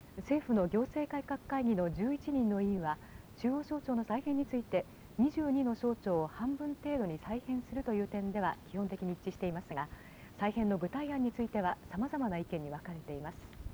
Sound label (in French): melodie